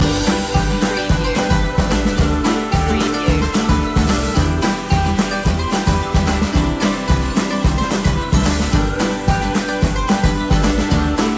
hay picking loop

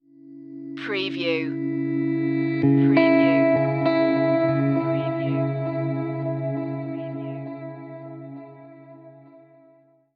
sedated stinger

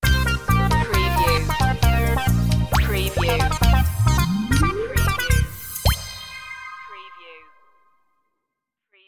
kids time sting

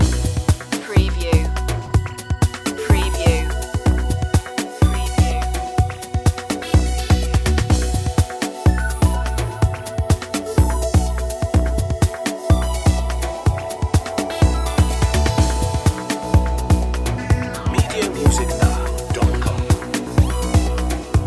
loop, chill

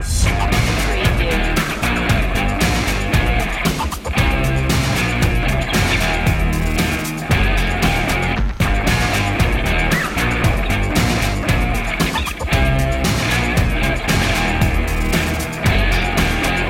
hard rock loop

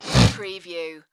Nose Snort

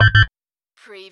Servo Panel Sound - Keypad

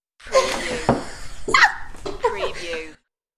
Womans Laugh

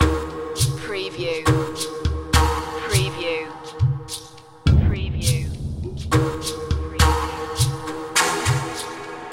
mountain fog loop

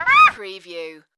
Duck Call

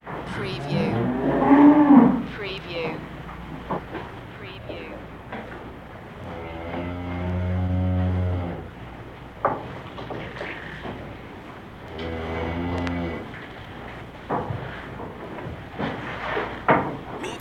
Cow Barn

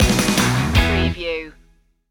driving fun stinger

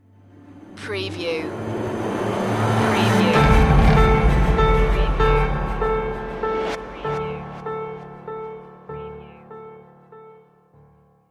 acid rain stinger

